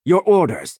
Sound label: imperial grunt enter play